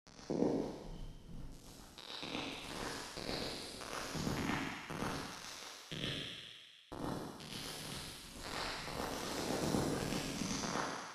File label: Large Hall Bright, reverbs; tcworksnative